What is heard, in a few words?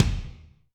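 Kick Big foot Room, Alesis D4; Bfoot Room; Kik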